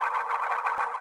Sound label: spin